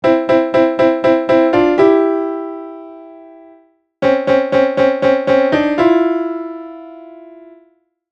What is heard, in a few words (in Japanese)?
ハーモニー